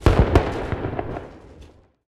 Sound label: firework